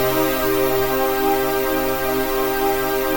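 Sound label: chord pad, Pads; Best Service; XX Large - Pads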